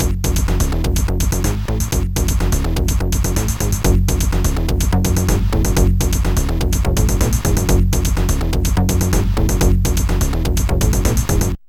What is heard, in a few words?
Bass test; Customplay